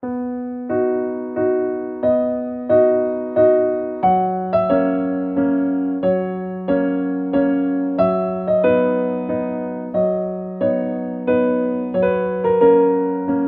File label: piano